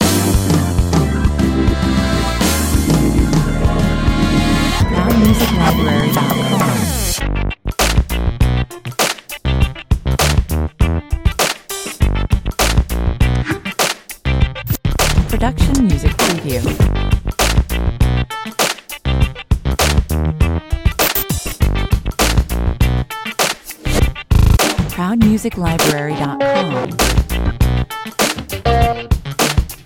funk machine 100 bpm

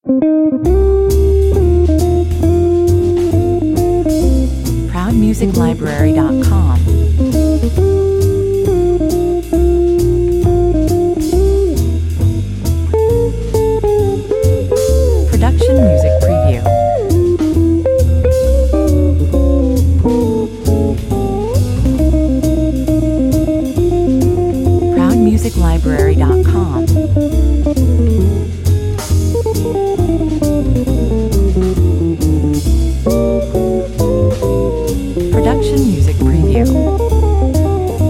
jazz session 77 bpm

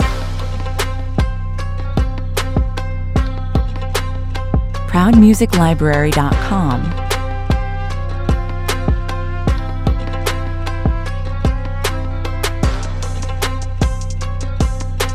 trap long looping version

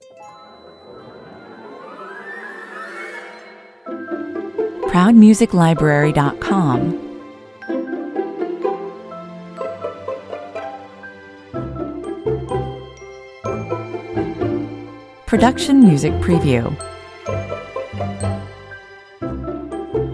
christmas talkover without choir 125bpm

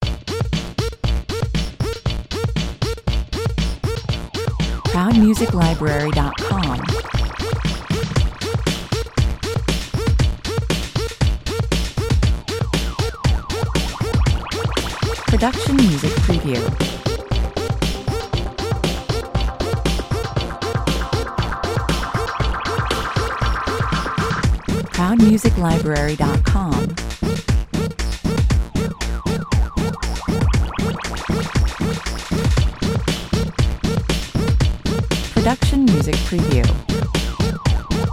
trip out on zip 110 bpm

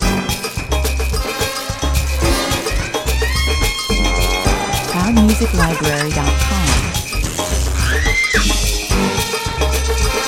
comedy (titles) 108 bpm

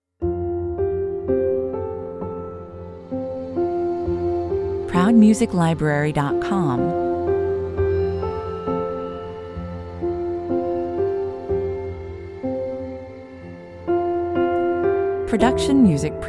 piano and strings

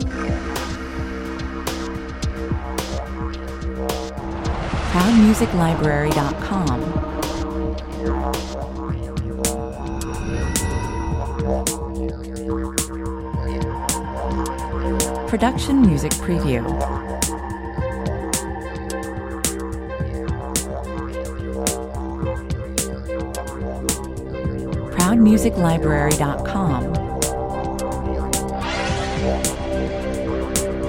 corporate kit main loop 108 bpm

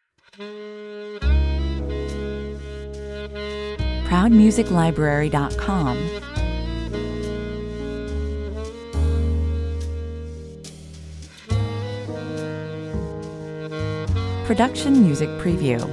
morning ballad

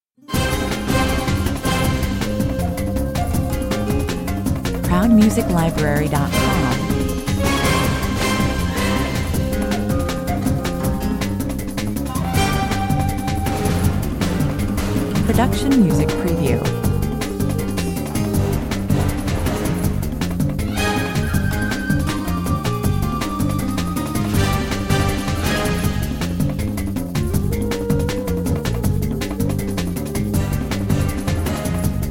eastern attack 160 bpm

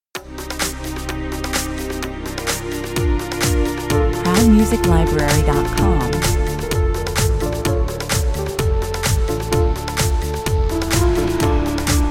orient beat, 128 bpm

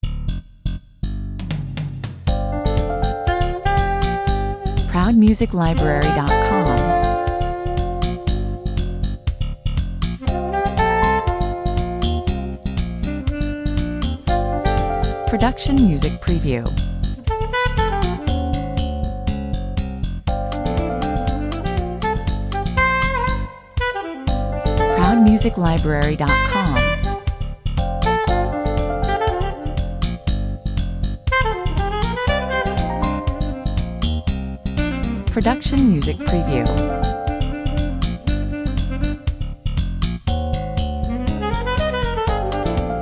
slap bass

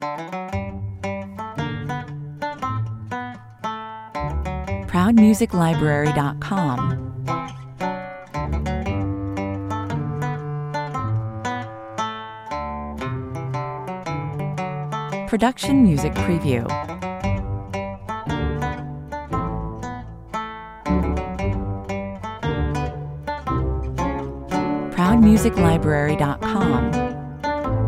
the banjo man

